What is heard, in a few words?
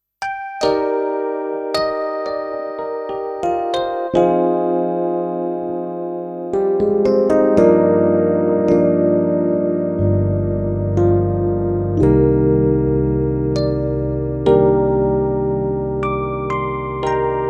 Korg D1 E Piano